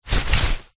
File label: foley jacket raincoat arm body movement single; zapsplat